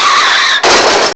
CAR SMASH; M8; CMI Factory

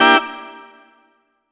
Surf Organ; Keys; Dr. Fink's Funk Factory

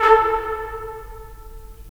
BRS, Tps Stacc; Roland - LA Composer vol. 4

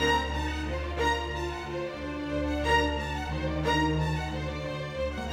Strings; Sonic Foundry (Sony Creative Software); Orchestral VOL-2; Modern 07

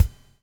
BUBL, BUBBLE; Spectrasonics - Backbeat

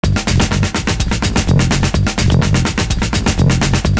GROOVY BASS; Univers Sons - Kitch 70s

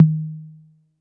B2 Analog Tom, Yamaha PSR-E323; Analog Kit; drum machine

